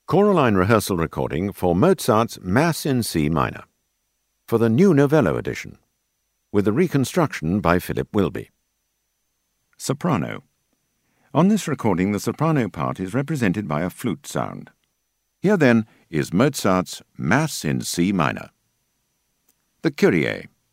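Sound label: Soprano; Mozart Mass C min(Nov); ChoraLine - Rehearsal CD & EasyPlay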